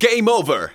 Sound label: vox; Ueberschall; Techno Trance Essentials